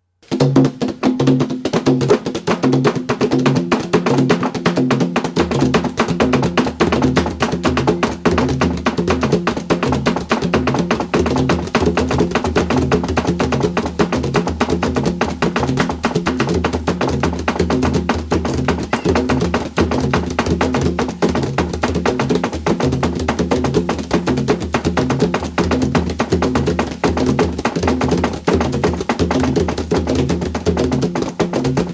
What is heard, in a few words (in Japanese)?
合奏; africa; 太鼓合奏曲